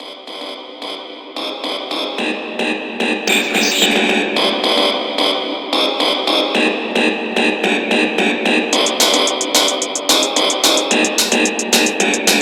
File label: Music Bass For Car